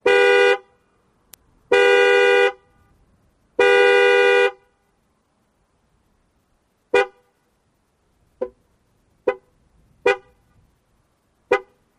Car Horn Honks Mid Siz

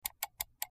Highpitched Mouse, mouse

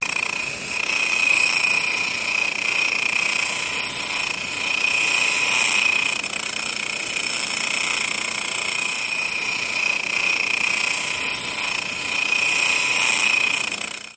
Grinder Metal

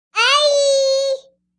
Baby Girl Shout, nineteen month old

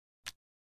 Card Flip Soft; casino games card games

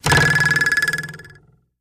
Springboard Boing

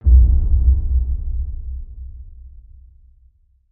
Bass Impact Various; impacts

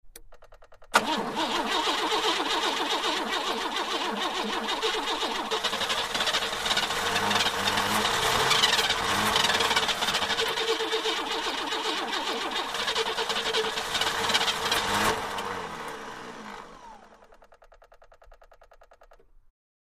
Car; False Start, Long Attempt, Almost Turns Over; Car 2

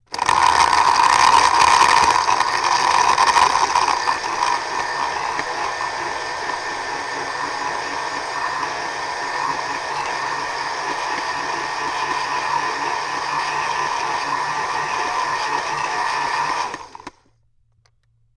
Blender Ice Cream

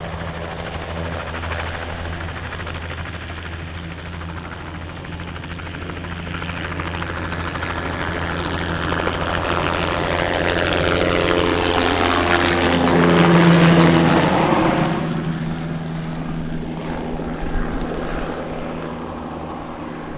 Heli By Medium, bell 212

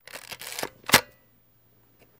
35mm Camera Wind Rel; shutters & movement

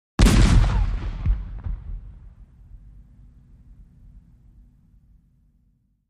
Civil War Cannon Shot; fire

